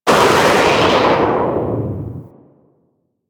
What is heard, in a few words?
Grapple Travel; Warframe; Berserker